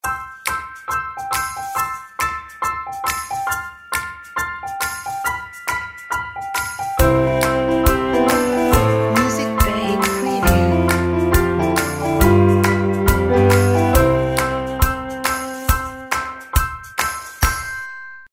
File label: Happy Cello Logo